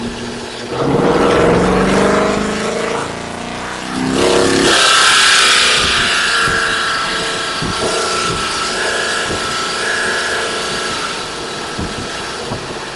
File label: x350 rev 93 octane